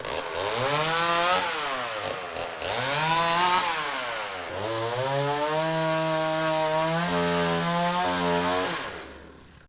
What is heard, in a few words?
chainsaw